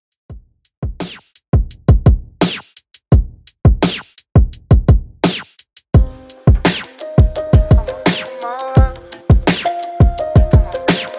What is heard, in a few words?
85 Bpm; Redrum